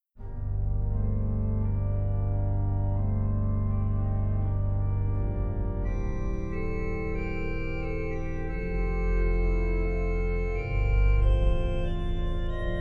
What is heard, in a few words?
32 and sesquialtera; organ; organteq2